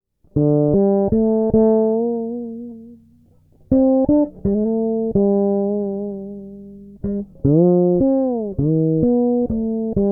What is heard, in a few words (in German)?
Bass Kuma Fretless 5 Melode Humbucker Tonblende zu